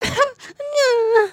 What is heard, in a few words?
cringe; emotes